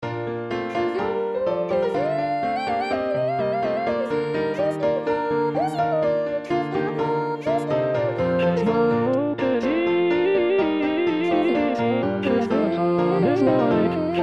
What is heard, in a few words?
Soprano; Messiah